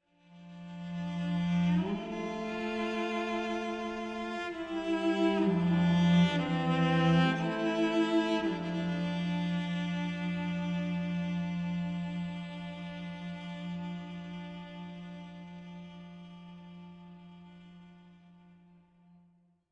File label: cello interlude high; Tyranny Gold Edition